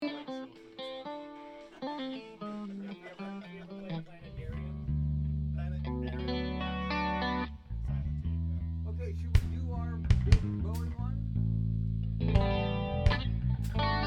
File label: Vocals